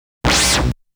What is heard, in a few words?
Enemy Attack Sound; Saint Dragon